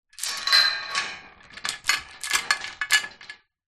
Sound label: industrial chain around metal fence movement; zapsplat